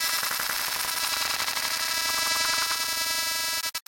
ac glitch; Five Nights at Ronald's

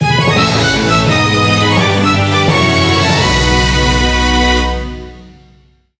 Jingle Win; Gale of Windoria

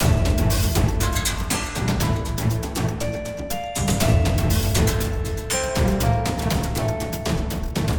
BGM Start; hidden dragon legend

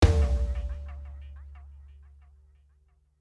Rhythm; PixelJunk Shooter 2; ps3

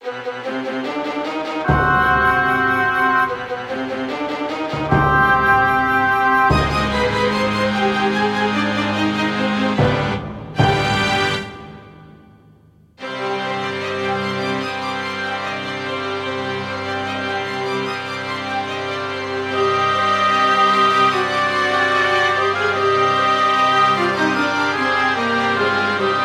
Dungeon; asdivine saga

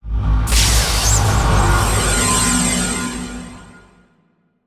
Wafu Effect; Se Solo Wafu Effect 03

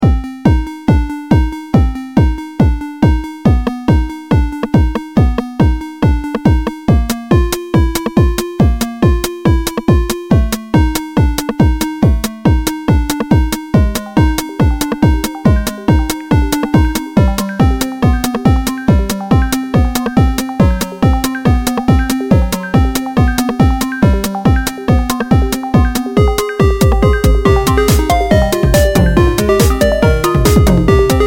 Retro Sewer Rave; Dungeon of Elements

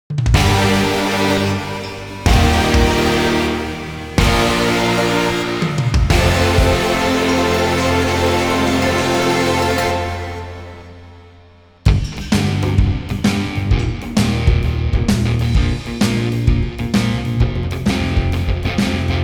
Boss Victory Intro

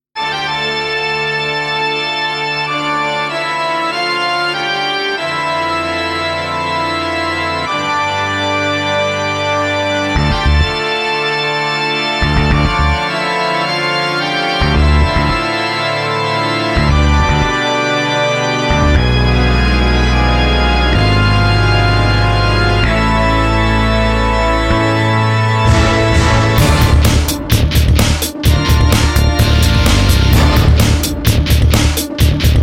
Hamburger Bell; Shibusen's Treasure Campus Broadcast Music Complete Works

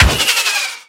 Fireworks Fireworks kick; Talking Tom Gold Run

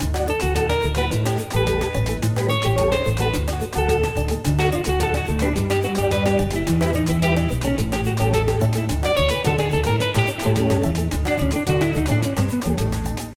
success loop; Cook Serve Delicious

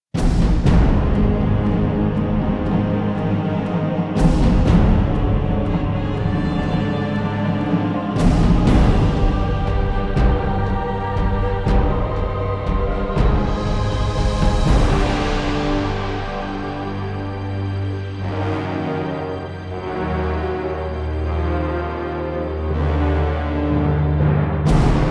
Defeat; Savage 2 A Tortured Soul

